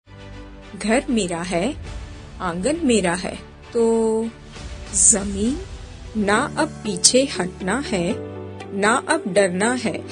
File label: jingle